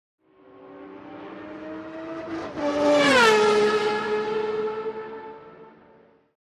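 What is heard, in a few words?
Sports Car Close Up By Fast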